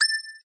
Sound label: ping